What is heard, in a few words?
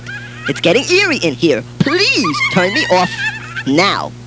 eerie; SHUTDOWN sound: "It's getting eerie in here! Please turn me off now."; Monsters, Myths & Legends